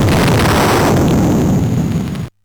sid MOS6581R4

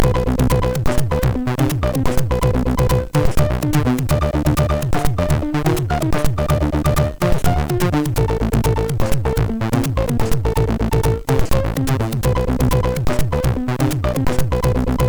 Crazy, sid MOS6581R3; hvsc

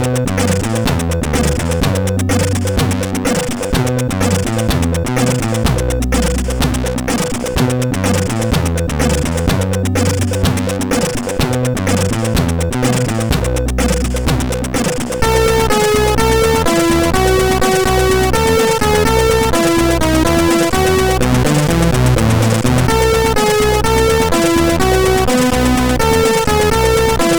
Trying Out, MOS6581R4; sid

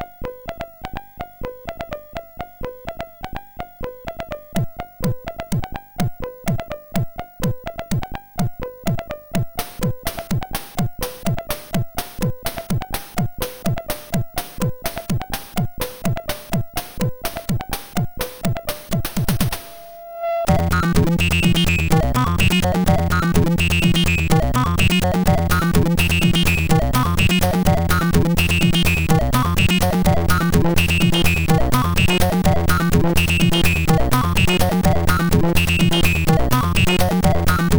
Technonoise

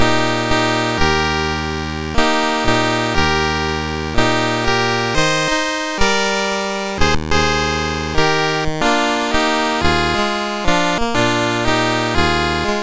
MOS6581R4, GAMES

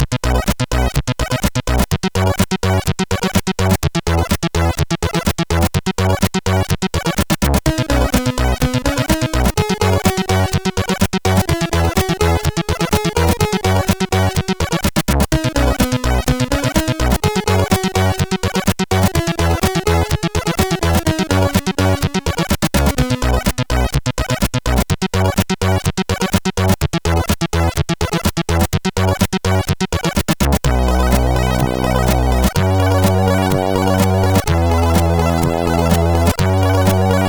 Weird Sounds, CSG8580R5; HVSC

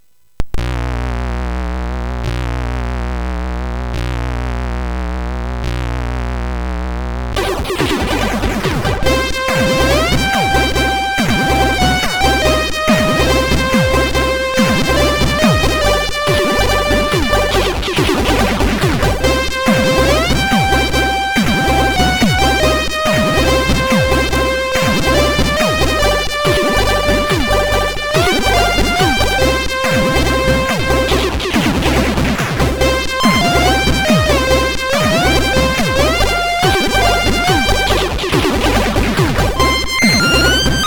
Intromusic, sid MOS6581R4; Mad-Max

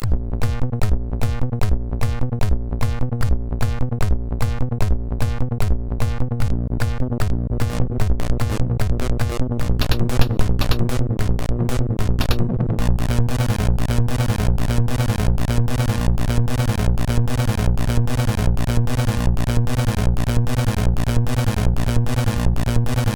Loop, sid MOS6581

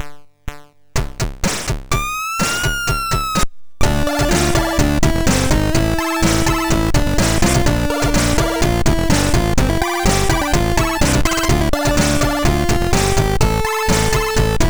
Chiptune, sid MOS6581R4

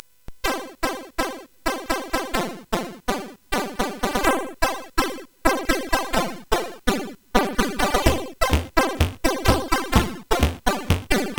Short n funky, CSG8580R5

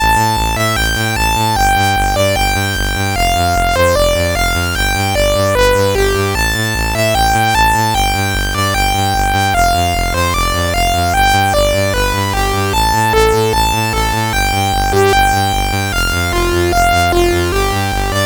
Crackers Intro, CSG8580R5; Danish

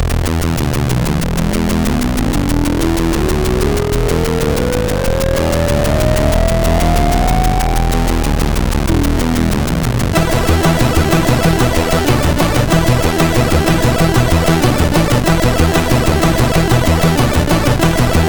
Spacey, sid MOS6581R4; hvsc

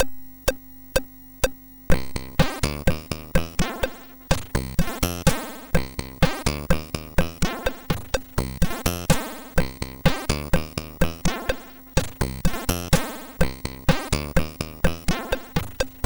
sid, MOS6581R2; Total Refill

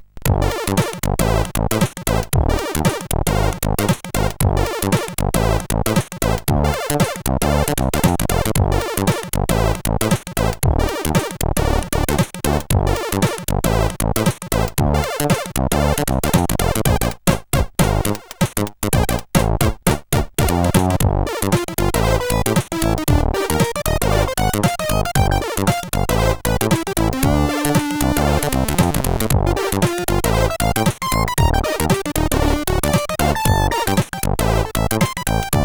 Camel Funk sid CSG8580R5; hvsc

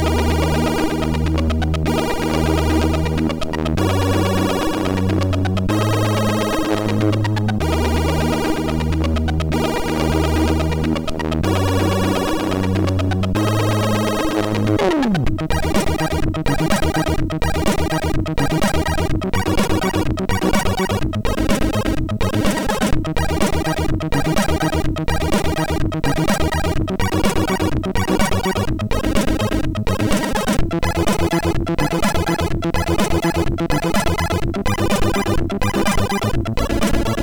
Intromusic, sid MOS6581R4; HVSC

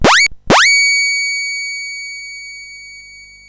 BASIC sid CSG8580R5

